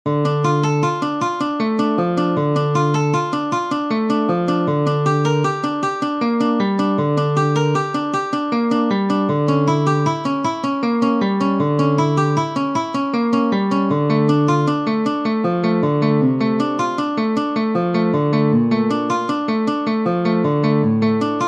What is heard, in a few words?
Prelude in D Minor, classical guitar; Johann Sebastian Bach; BWV 999